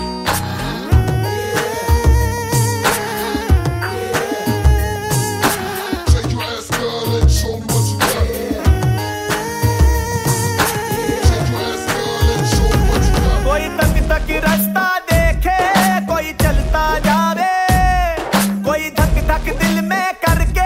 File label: new hindi ringtone